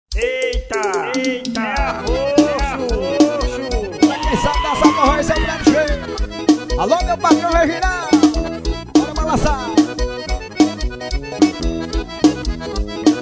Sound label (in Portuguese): forro colado